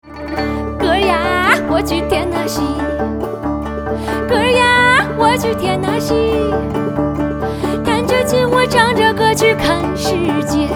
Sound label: Banjo Guzheng Pickin